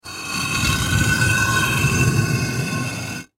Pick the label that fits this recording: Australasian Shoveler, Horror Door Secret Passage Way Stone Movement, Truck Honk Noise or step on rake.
Horror Door Secret Passage Way Stone Movement